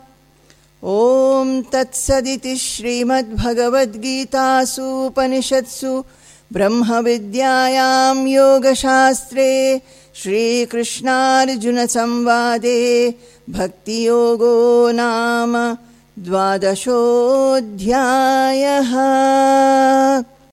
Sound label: Ending Chanting; chapter 12 bhakti yoga; bhagavad gita; conclusion